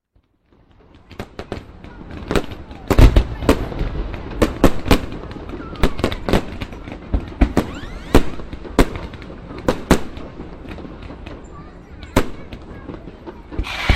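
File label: fireworks surround sound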